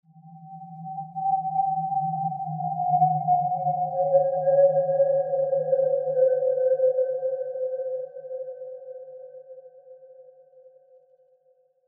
musical haunting

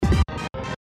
Beat Stutter

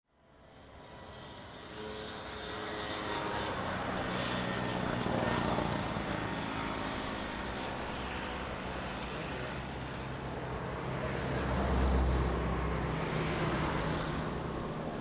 traffic

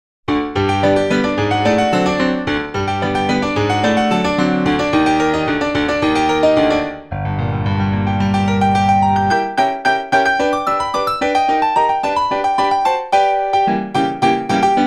Maple Leaf Rag Early American; Soundzabound Volume 2 Disc 3

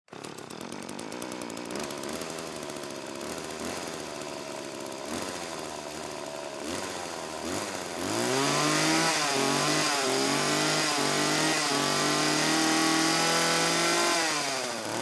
chainsaw

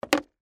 skateboard ollie

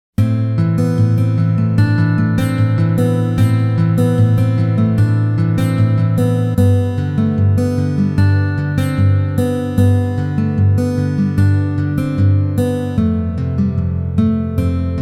Acoustic Silhouette; Soundzabound Volume 4 Disc 3

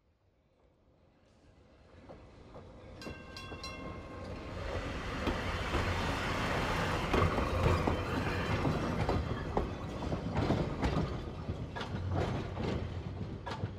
Trolley Passing with Bell

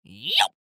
Weird Noise Yop

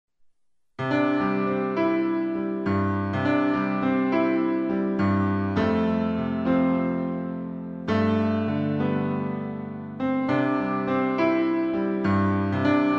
Ambient

